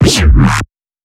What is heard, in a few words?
Basic Neuro Bass